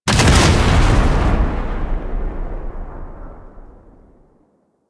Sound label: Alchemy Vent Explosion; Warframe; Entrati